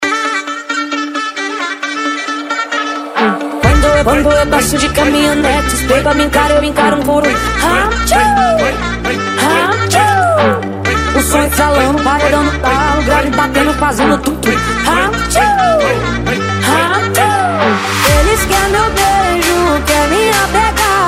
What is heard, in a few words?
Sertanejo Remix